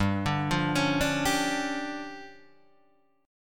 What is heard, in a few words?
D Minor Major 7th Double Flat 5th, G